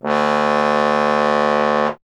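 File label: bass trombone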